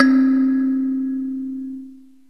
AFRICAN INST; OMI - Universe of Sounds

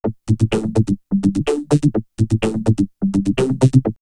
Vocoder Loop; X-Static Goldmine; VocoderLoops

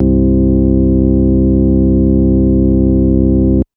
Organ B3 c1; TrashLibrary

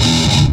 CHUGGER, Distortion Gtr; PlatinumPhattCD2; E-MU Producer Series Vol. 8 – Platinum Phatt

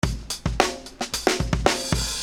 Beat, Hip-Hop Street Beats

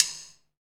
SD RI, SD Rim; Sound & Vision - Gigapack I

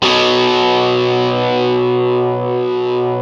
GTR, Metal Chds; Club-50 - Foundations Roland; Power Chds

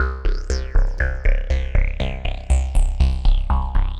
Scandle Synth, Synth Textures; Sonic Foundry (Sony Creative Software); The Electronic Music Manuscript Collection

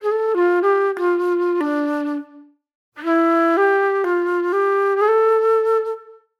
Reflective Flute

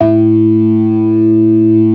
ORGAN SYNTH; InVision Interactive; Keith Emerson

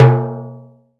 CENTER, Timbales; E-MU Producer Series Vol. 2 – More Studio Essentials; Composer; Timbales Xswitch

